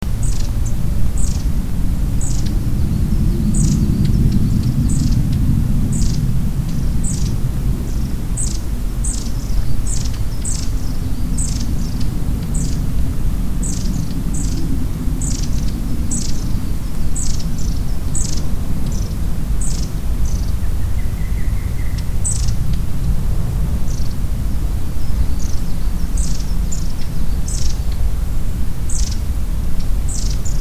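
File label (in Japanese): enaga, long-tailed tit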